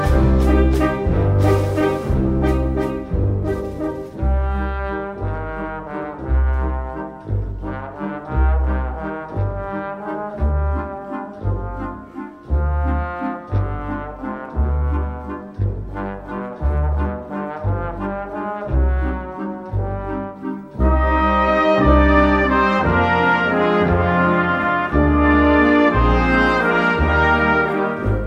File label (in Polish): waltz no 2 solo puzon